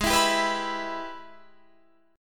Ab Minor Major 7th Sharp 5th, down, Guitar, Standard; strummed; JGuitar